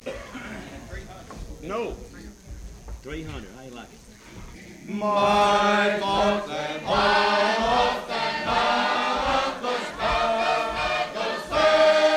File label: Sacred Harp Singers; Calvary